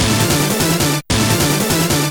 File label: beatbox; Pinball Dreams